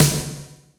Electro Snare; Yamaha PSR E305

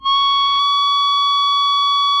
GTR, GUITAR FX

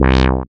SYN MC WAH, Roland MC-202

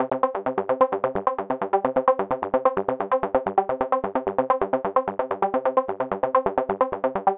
130 C synth, Synth Loops, Trance; Trance Explosion Vol1

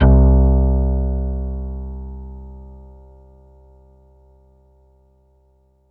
piano C1, Koto Piano, EMU E-III; ProSamples vol.55 - Retro Sampler

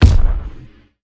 crunch kick

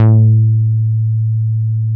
A2, MOOG1 BASS

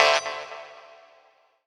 TING; Rawcutz; Nu Forms

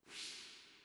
NOISE, hi-tech kits; Crypton - DrumBase5000

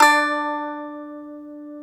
Baglama D3; EMU Planet-Earth

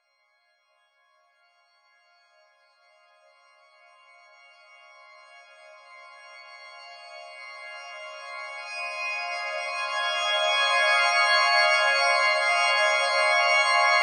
cmaj pad

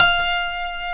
G, funk guitars

